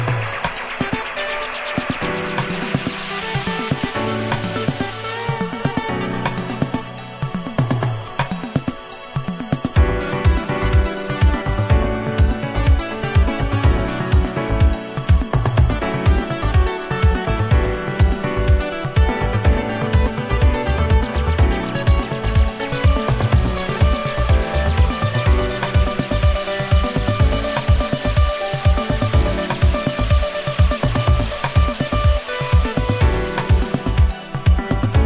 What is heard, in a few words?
drum